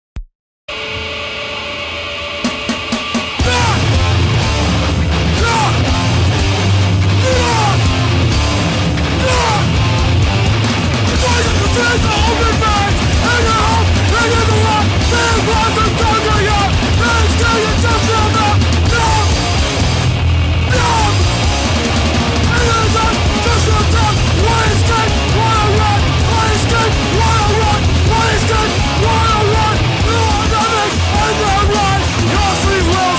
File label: positive noise comp track